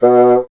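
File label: wind bassoon B; Miroslav Vitous - Solo Instruments VOL-1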